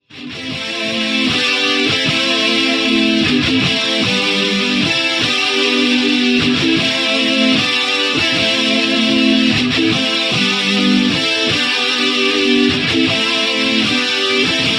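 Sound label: guitar intro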